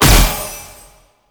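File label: Kunai Projectile Explosion, Weapons; Warframe; Choir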